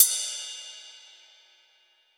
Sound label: real closed hats